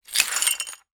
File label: foley broken glass sweep up dustpan plastic; zapsplat